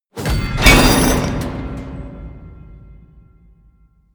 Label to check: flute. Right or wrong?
Wrong